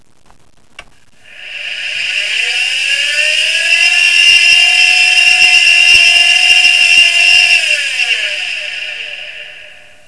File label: Bad Bearings, data recovery sounds